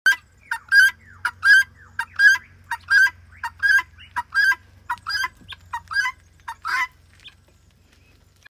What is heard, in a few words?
Guineafowls